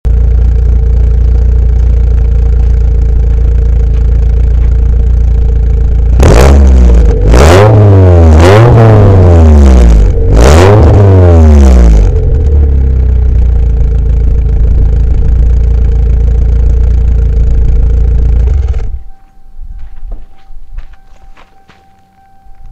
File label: Exhaust